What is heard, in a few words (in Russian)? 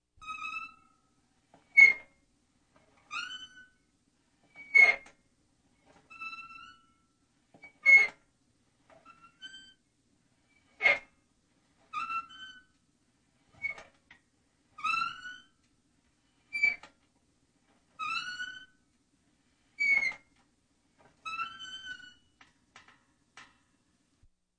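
мелодичные скрипы калитки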